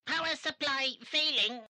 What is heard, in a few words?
Power Supply Fail; K9